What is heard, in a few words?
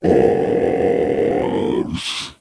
FIRE MAGMA